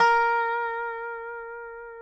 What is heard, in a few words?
DOBSUSBB4 -R, dobro notes; Country